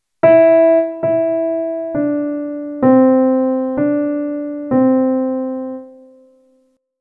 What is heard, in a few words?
Melodic Dictation Pitches Only